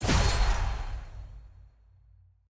content click

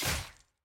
hit; samira

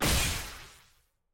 aah; lux

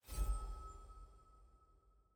sfx ui generic collection artifact hover

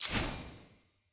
burst; eog ui bronze

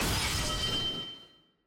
status shield break

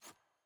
sfx ui generic section microfiche hover

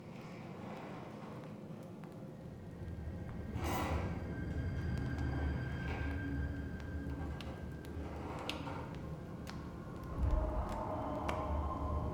amb interior; thresh; lol

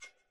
generic button hover

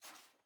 sfx ui generic section paper hover

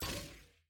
ui generic button click

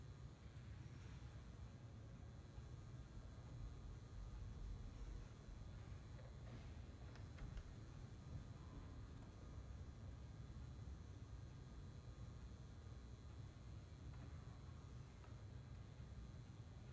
amb collection

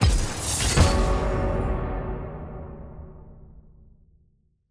ready check decline button click

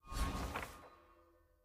sfx ui record finish click

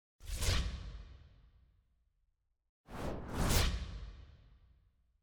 sfx brackets consolation; clash; lol

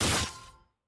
aah; gwen

